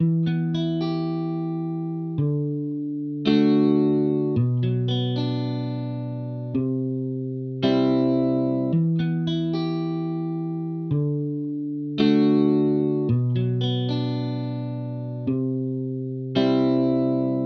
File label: Main Guitar, 110BPM Emin; 99Sounds; Monosounds; Music Loops; PANDORA BOX!; Waterfall